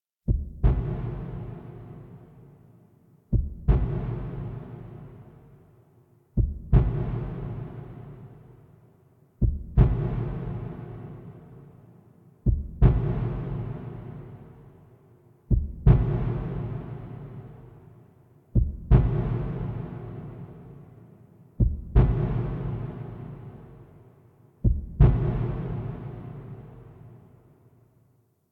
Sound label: Heartbeat; Operator Nursery End; Warframe; Orbiter Nursery